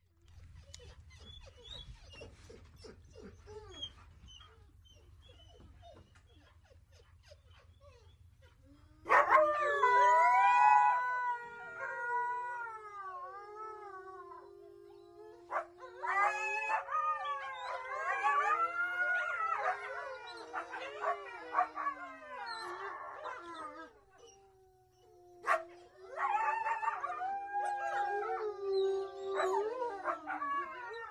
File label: WOLVES CU PACK WHINE BARK HOWL; wildlife sounds